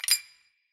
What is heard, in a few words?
perc; eddyflux